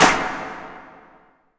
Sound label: IMP SNR DRUM, Drum, Cinematic Impact